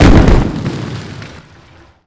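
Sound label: impact stone pillar distant; Explosions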